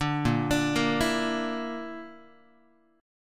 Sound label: Bb Major Flat 5th, Guitar; arpeggiated; JGuitar